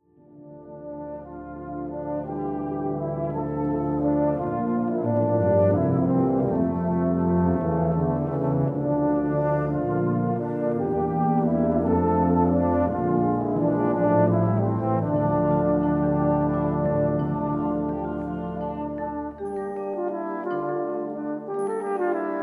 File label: Brass Band Overture